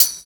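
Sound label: tambourine; East West - Phat & Phunky CD2; 90's Sample CDs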